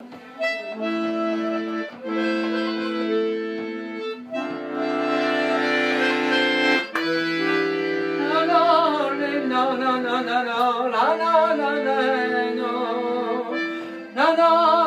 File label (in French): Rythmique; Plinn; Claude